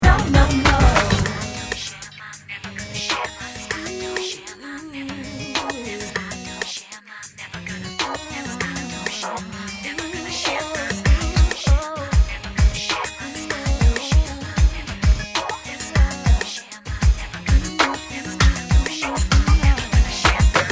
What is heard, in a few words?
Urban Pop